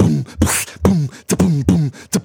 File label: Beatbox Loops, Human Beatbox